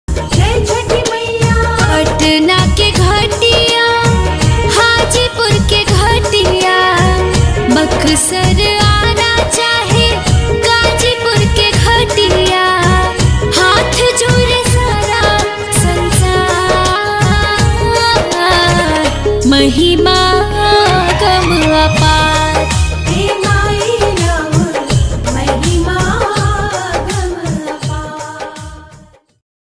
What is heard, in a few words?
bhakti ringtone